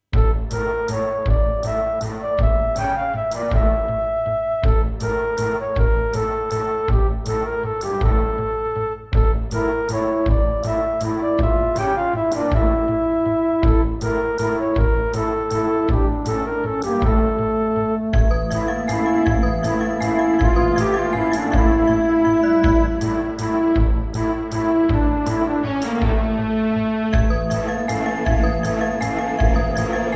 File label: Turtle Beach Rio